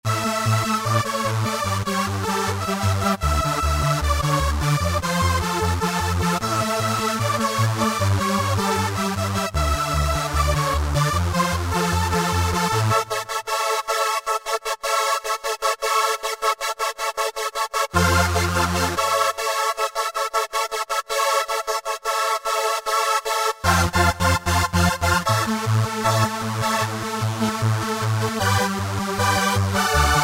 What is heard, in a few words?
Euro Saws; Roland SRX-05 Supreme Dance